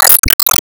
Enemy Bombbumpo Active Throw

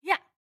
Attack Spear; Going Under; Jackie

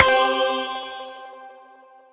Score Bonus Tinkle; HUD

